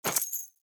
Crate Coin Drop; Objects; Pickups; Neathuns

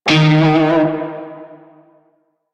Shawzin Flourish Oct Two D Sharp; Music; Warframe; Narmer Shawzin

